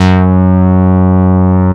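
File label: BASS OBMX, F3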